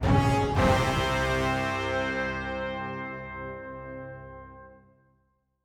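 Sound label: combat boss end fade out, soundtrack; Tyranny Gold Edition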